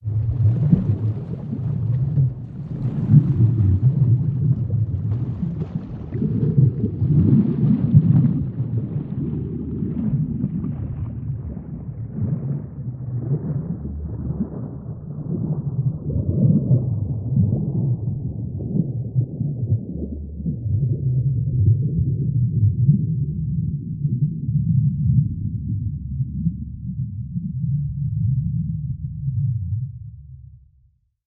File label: sinking in the sea; mixkit